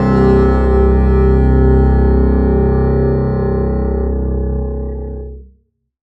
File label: super cc p, double bass arco; dsmolken